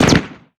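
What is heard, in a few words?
impact explosive round sweetener; Modern Warfare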